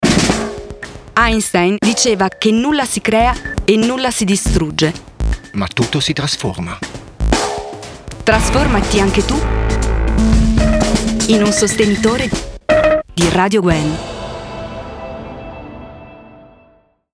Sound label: Jingle; Relatività